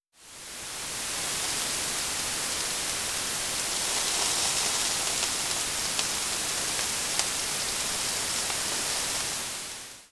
Sizzle and white noise

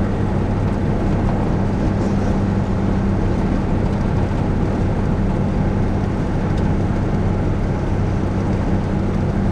Truck sample unfiltered

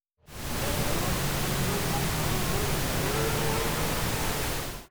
High Rev pink

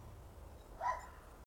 single bark, dogs

